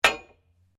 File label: SLEDGEHAMMER METAL IMPACT HEAVY